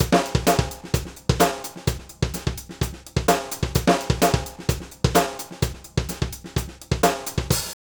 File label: overhead; micros overhead; audioctone km-8p